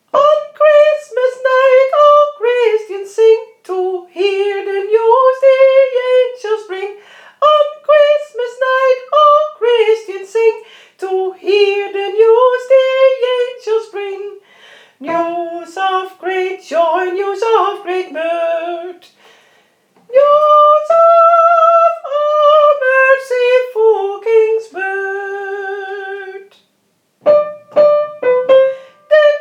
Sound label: sussex carol mezzo